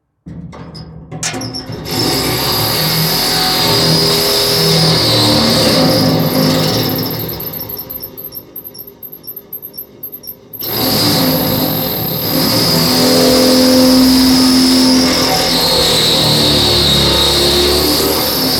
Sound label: Evil Machine